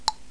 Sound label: collision; Laser